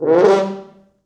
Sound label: Horns Sax, FrHorns FXMOD; East West; Quantum Leap Horns Sax; 4 FrHorns FXMOD